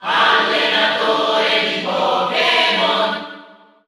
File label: Cheer Italian; Pokémon Trainer Male; SSBU